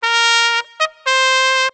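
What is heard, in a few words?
trumpet toot; Musical Instruments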